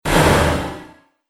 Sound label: Synthetic Crash Sound, impact